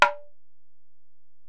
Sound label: Ac Perc, Drum Machines Alesis DM-PRO